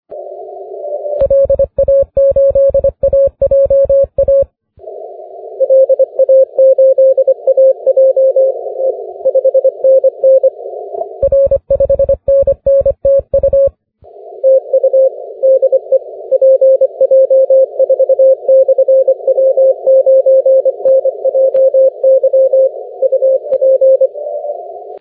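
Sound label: 17m continuous wave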